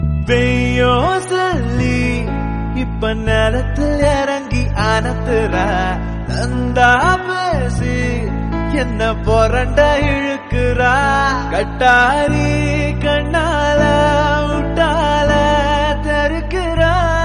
Love Rap

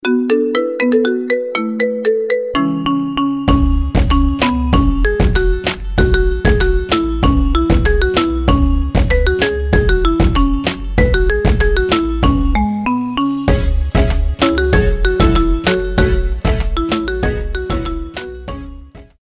Marimba Ringtones Remix

